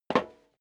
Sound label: step leather shoes metal dry single stair up; schritte